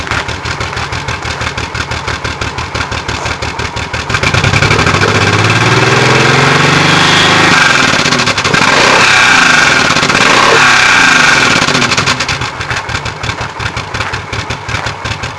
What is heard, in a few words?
VTX1800C Factory 81-105db, exhaust; Honda